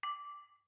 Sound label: gem Counter; imperialriches